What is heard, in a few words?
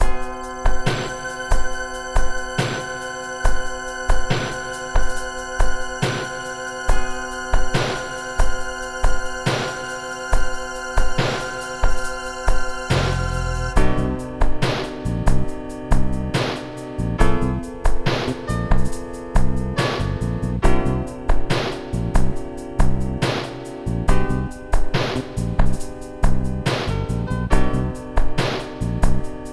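background music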